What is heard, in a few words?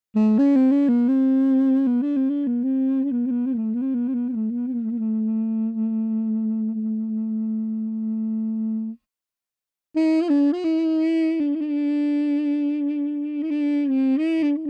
Duduk Persian Ney Phrases